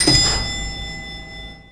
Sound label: elevator ding; Tony Hawk's Project 8; XBOX